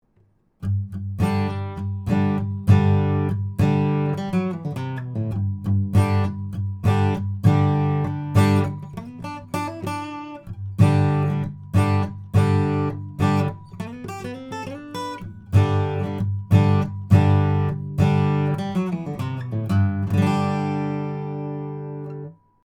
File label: guitar